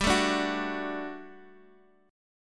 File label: G Major 7th Suspended 2nd G, Guitar Half Step Down